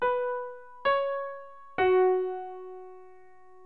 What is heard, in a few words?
PIANO; PSAudio